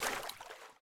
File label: Step Deep water; miside demo